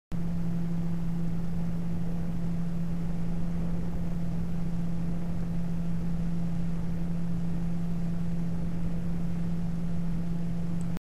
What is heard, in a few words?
Gelid Silent 12 12V